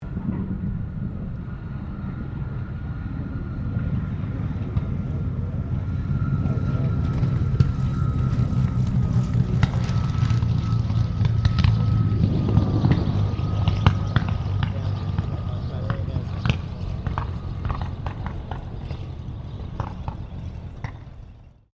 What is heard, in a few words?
AIRCRAFT CAC CA-18 MUSTANG MK23 LAND; CA-18 /P-51 Mustang; Airplanes